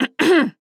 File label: Clear Throat; Princess; Paladins